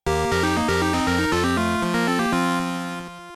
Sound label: Unused Level Complete; Millipede